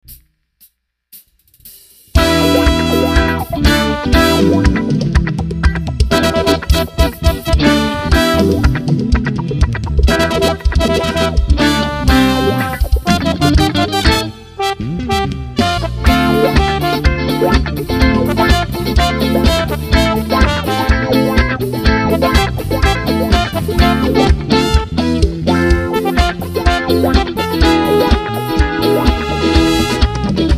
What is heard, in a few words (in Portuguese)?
banda ao vivo